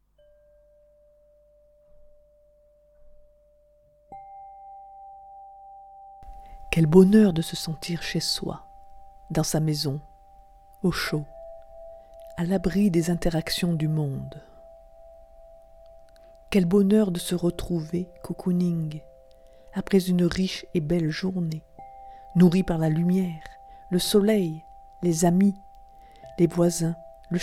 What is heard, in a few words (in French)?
chez soi et harpe